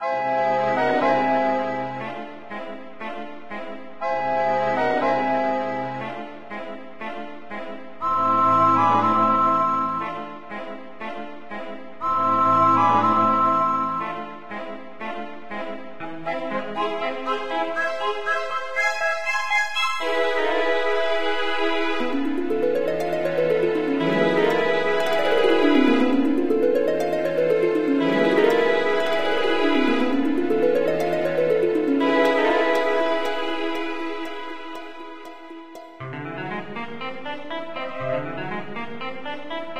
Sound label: dungeon; MapleShrine; mkxp; gameasync